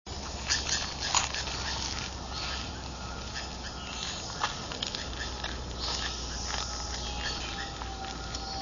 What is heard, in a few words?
blue jay sound; birdsongs